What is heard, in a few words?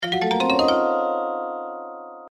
multiplier increment; Egyptian Empress